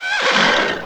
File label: horse hit; wesnoth